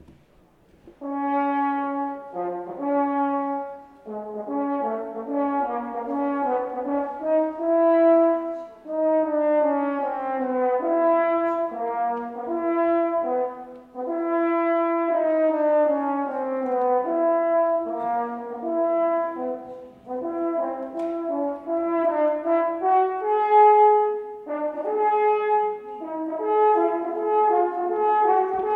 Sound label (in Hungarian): fanfar; mihalovics